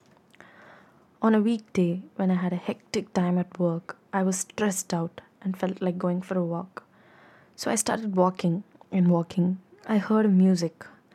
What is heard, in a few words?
soundscape africa house drumming session